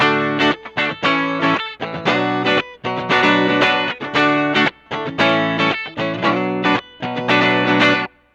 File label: guitar loop D 115bpm